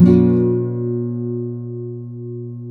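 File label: nylon guitar down minor C, flam chords; Spectrasonics; Hans Zimmer Guitars VOL-2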